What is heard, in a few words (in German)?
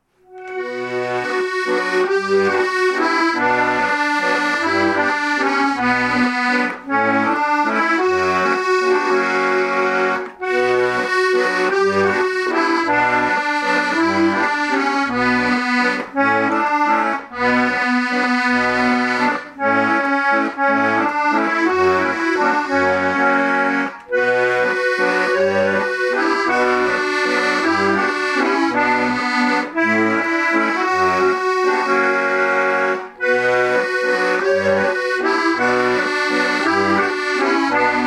Musette